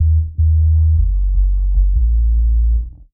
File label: bass, Loops